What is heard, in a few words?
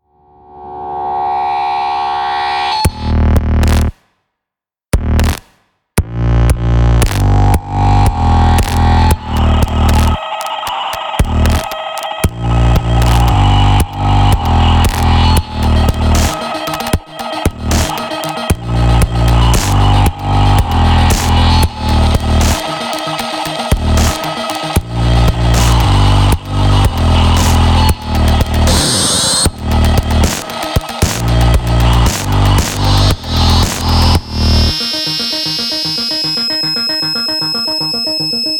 video music